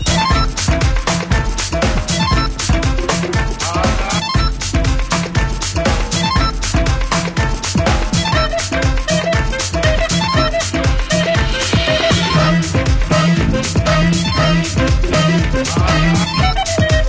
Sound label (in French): Musique